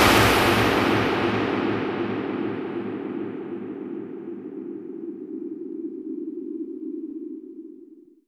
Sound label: Impacts